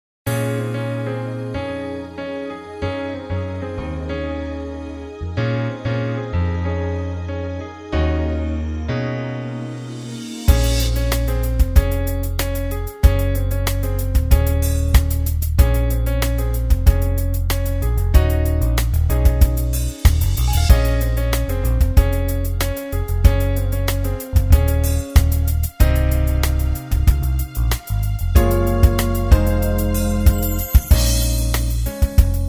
No Sax No Vocals Key Of B flat Minor, smooth jazz backing tracks